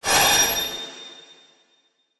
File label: iainuki, battle